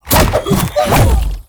Launch Shroom Cast; Warframe; Nokko; Launch Mushroom

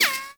Bullet Impact Ricochet; Warframe

